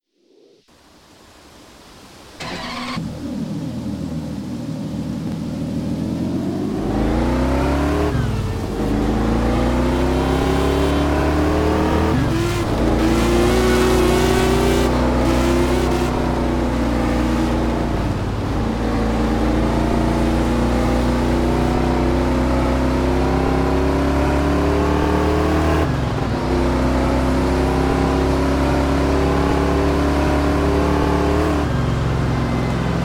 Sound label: pagani huayra bc 2016; TDU-1